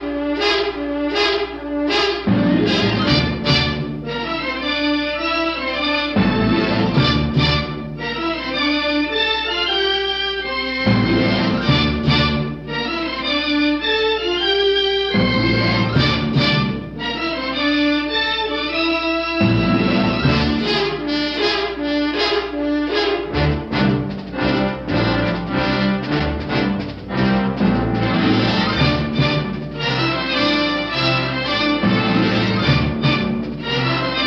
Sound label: opening titles; KRAFT SUSPENSE THEATRE